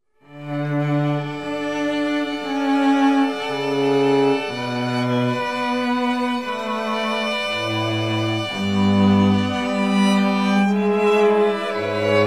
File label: Air on the G String; Soundzabound Volume 2; Bach